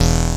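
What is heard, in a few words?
synthesizer; Best Service Gigapack 1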